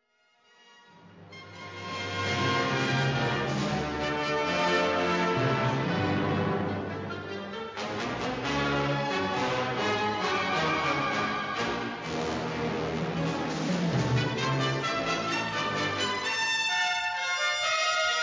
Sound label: Fanfare excerpt